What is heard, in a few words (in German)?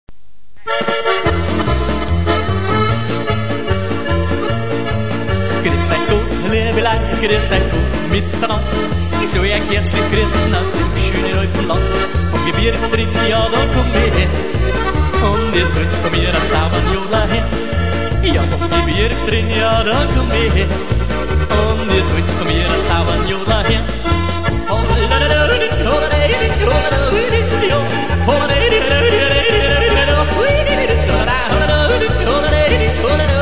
Jodler; Gruess euch Gott